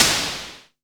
Perc Noise Long, Tama TS-206

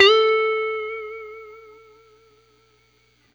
BLU BEND UP, Guitar & Bass; 90 s Sample CDs; Quantum Leap - Guitar & Bass

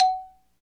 XYLO, Afro Xylophn

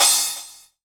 crash, Korg Electribe ER-1; Kit 9

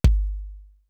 RAP KCK, HR-16 DRUMS; Greytsounds

